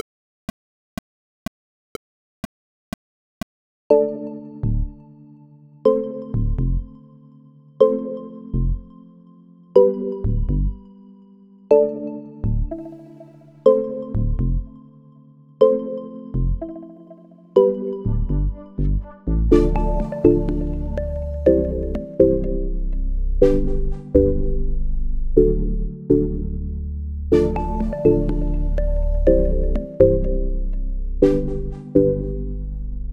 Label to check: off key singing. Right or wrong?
Wrong